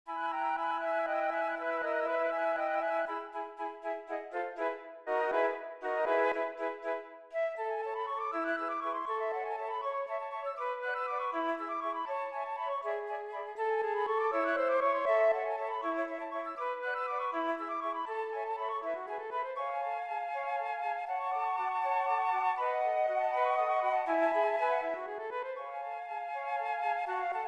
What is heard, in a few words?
Quartet